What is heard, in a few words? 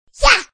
yell; chibi knight